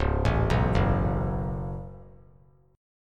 C sharp 7th Suspended 4th Sharp 5th, C sharp, chord; arpeggiated; 1,4,2,4